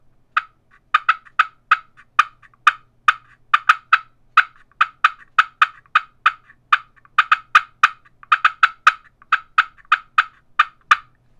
the green machine cutts, turkey; Southland

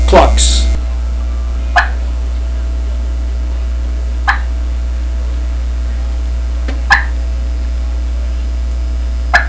challenger clucks, turkey; Southland Sounds

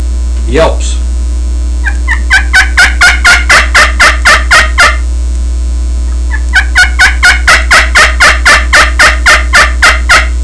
slate yelps, turkey; Southland

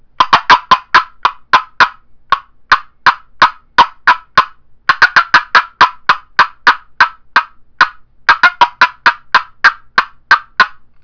cedar cherry box call cutts, turkey; Southland Sounds

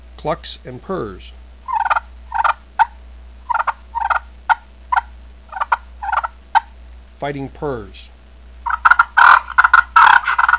dueling, turkey

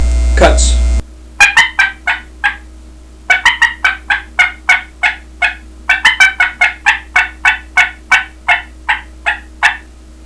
cutts, turkey; ultra mini mag; dixie

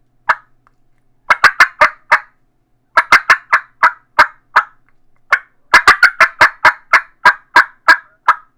batwing IV cutts, turkey; Southland Sounds

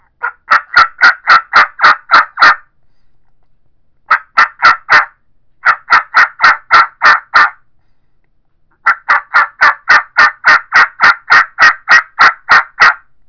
cuttin edge mouth call yelps, turkey; Southland Sounds

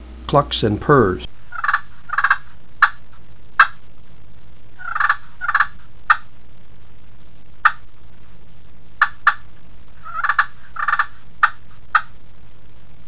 glass clucks purrs, turkey; dixie

